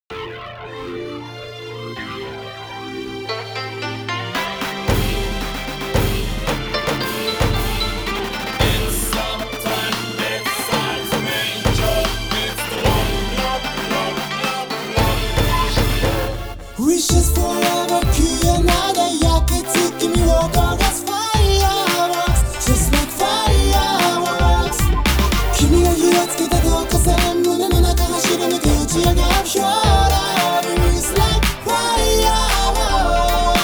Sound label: FIREWORKS